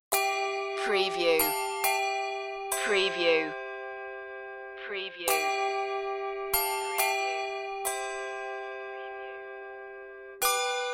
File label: silent night handbells